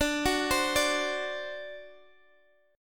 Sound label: D 7th D Guitar Standard; arpeggiated; x,17,16,17,15,x; down; capo=9